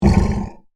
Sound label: Monster Grunt, Horror, Monster, Grunt, Creature; Monsters (Sound Effects:Horror); BlastWave